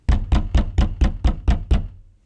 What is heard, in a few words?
knocking